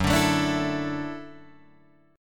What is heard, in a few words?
F sharp Minor 7th Sharp 5th F sharp, Guitar DADGAD